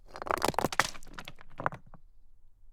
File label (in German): Stein Aufschlag Mit Langem Decay; Monster Destroyer